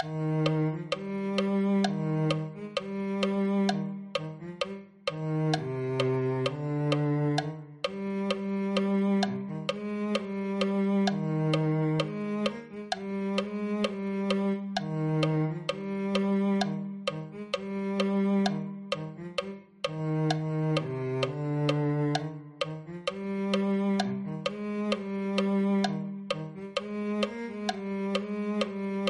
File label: cello